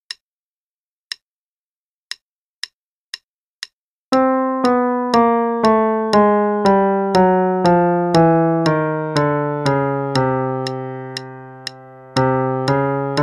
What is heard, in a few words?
The Chromatic Scale (LH 2x, qn=120); Level 3A - 2nd Ed